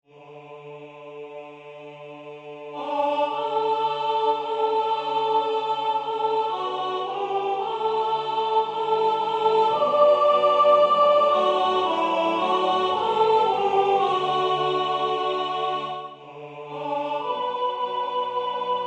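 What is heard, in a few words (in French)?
soprane, Musique sacrée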